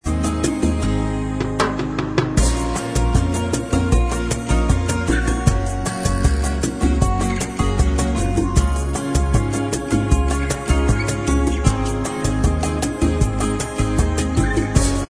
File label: bossa style Inst